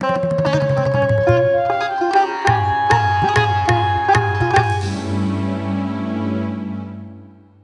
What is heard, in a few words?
Bari Sax; simpsons hit run ps2